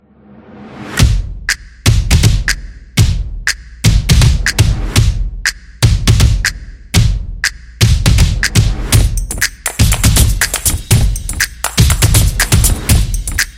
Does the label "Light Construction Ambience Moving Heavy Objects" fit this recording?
No